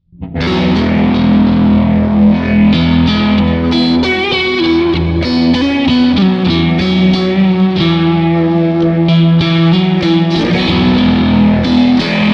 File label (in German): Keeley Realizer Reverb Soft Focus mit Phaser Delay